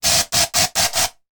household air freshener aerosol can spray multiple quickly